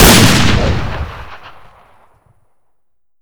canberra shoot; tf2classic

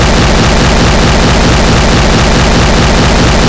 akcannon fire, weapons; tf2classic

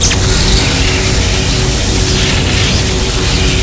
Beatophone Fire Crit, weapons; tf2classic

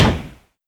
flame thrower end; tf2classic

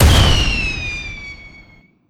shoot; heater; tf2classic

